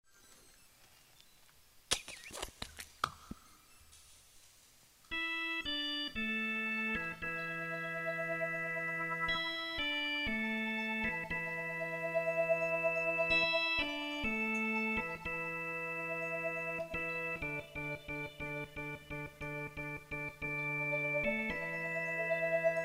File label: Organ Vocal Version